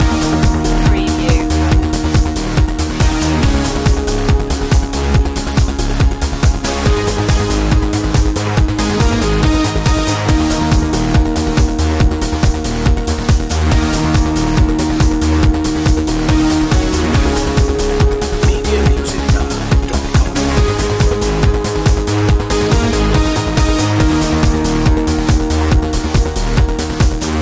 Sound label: mystic loop